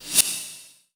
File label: Reverse Hat; Sonic Foundry (Sony Creative Software); Knutrix Drum & Bass